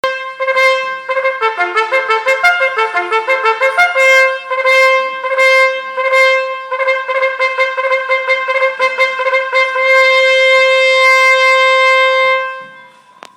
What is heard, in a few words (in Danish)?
trompet fanfare lang; trumpet tune fanfare